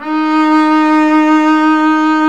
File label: STR, Cb Bowed, Cb2 f vb; String Master Series